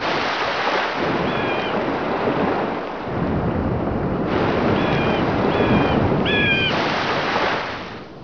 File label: waves